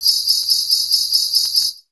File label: Percussion; Coldcut's Kleptomania VOL1